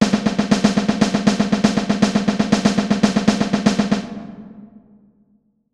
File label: Accent 120bpm